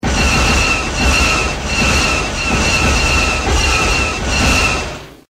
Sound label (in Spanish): mil disparos; Source Engine; deathrun starwars